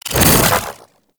Jump; Warframe; Corpus; Arachnoid Wraith; Abilities

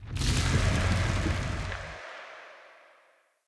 Shrine Portal Deactivate Vines

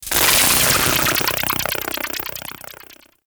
Throwing Sticky Bomb Projectile; Tenno

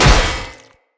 Great Sword Impact Armour, Combat; Weapon Impacts; Player; Swords; Founders Great Sword

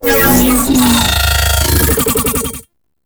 Aggressive Pose; Warframe; Corpus; Jackal Redux

